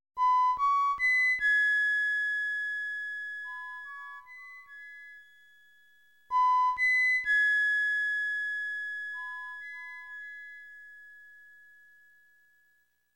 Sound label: Whistle; Yamabiko's Whistle (ME)